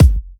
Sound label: Soft Kick; Vengeance Samples